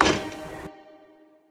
quickStop; hiddencoinsofegypt

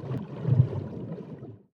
spin start; reef raider

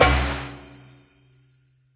spingle Drum End; wild bazaar

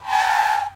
crab Skid; reef raider

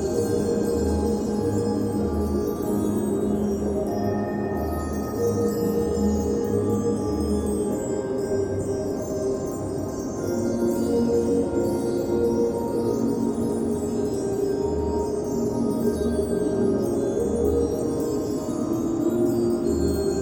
intro loop; secrets of christmas

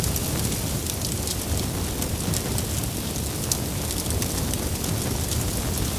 bonus Burning

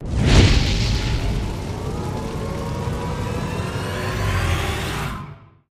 near Win

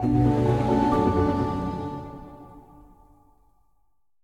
quick Spingle; fairyhansel

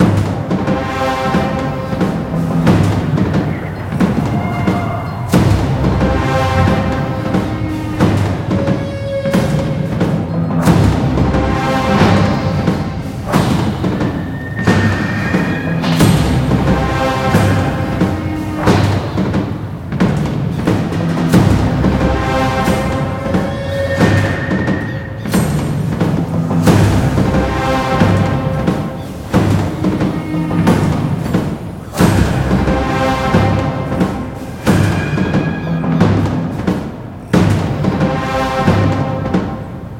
main theme intensity Level; conan

